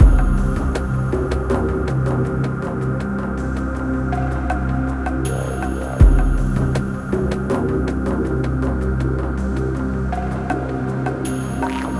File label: tension; MirrorsEdge